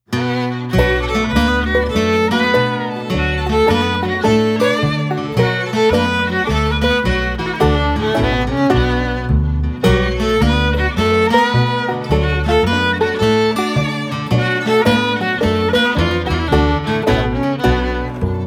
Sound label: slow guitar and melody violin; jews and classical music